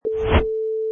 Rush Short Tail

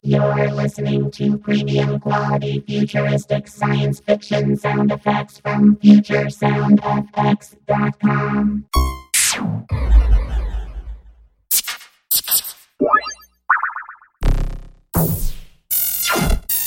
Future Sound FX

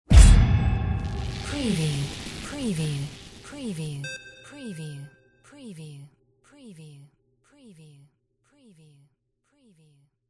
sci fi magic electric rune; windy beach audio

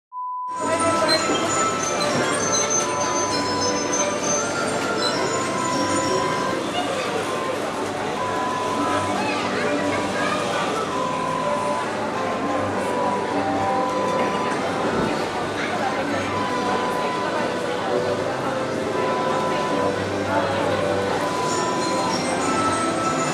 Carousel sound

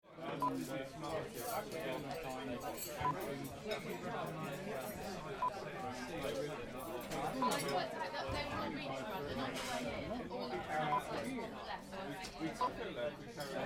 Indian Restaurant UK Busy

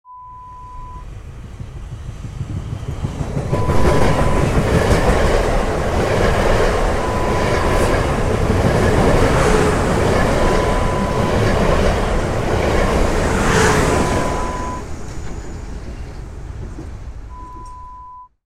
train passing sound

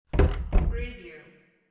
closet door close

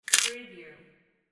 bone crack

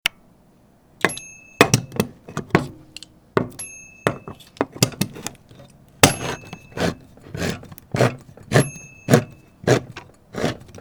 Opening a Can of Food With a Manual Can Opener

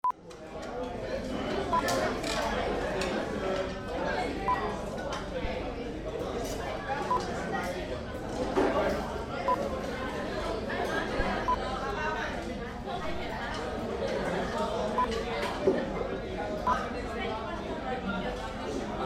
Busy Chinese Restaurant Singapore

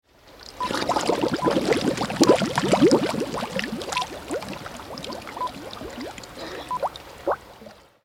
Bubbles

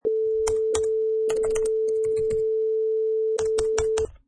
Keyboard Hits

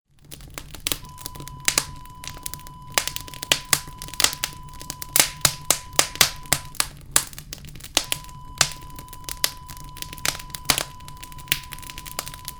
fire crackling

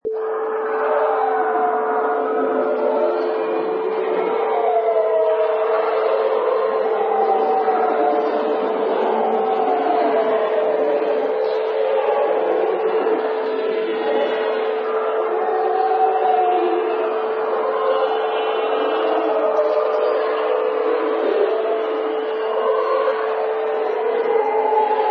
Hell Voices Medium

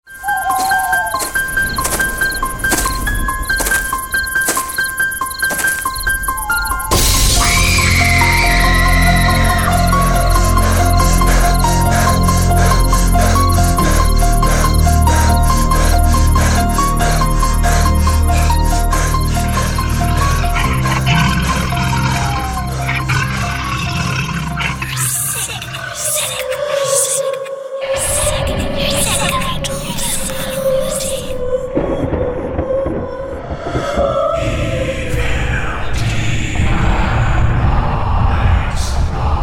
Halloween Shuffle

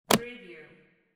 briefcase lock

